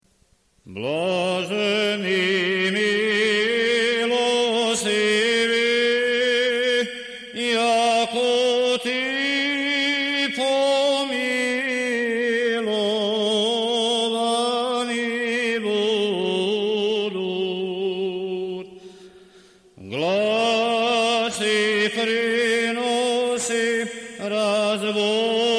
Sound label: Osmoglasnik II glas